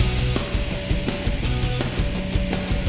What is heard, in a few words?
Boss Fight Phase 2 MIXED